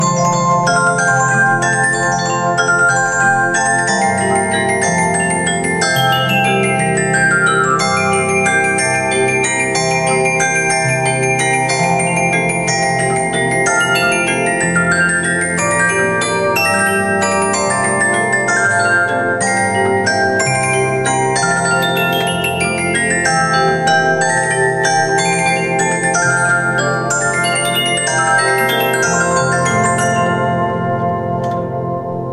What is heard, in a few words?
merry widow waltz musical box; chimecollector; music box chimes